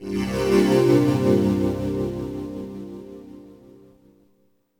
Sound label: Cm17; Chords; M8; Electronisounds